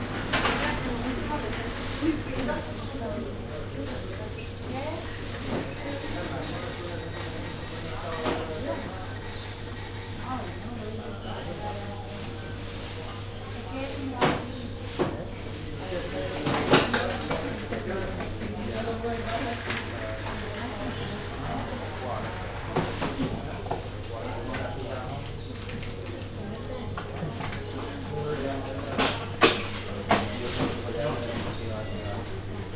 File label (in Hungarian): elelmiszer uzlet